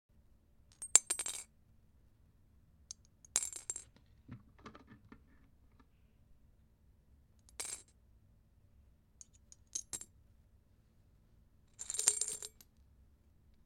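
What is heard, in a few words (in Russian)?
очень медленно закидывают по одной монетке в копилку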